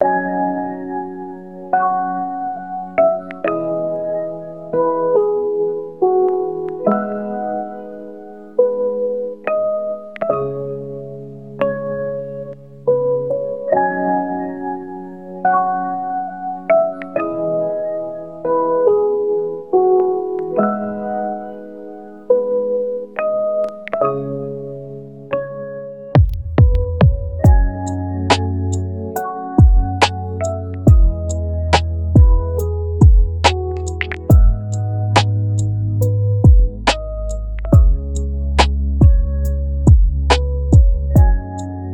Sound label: rainy lofi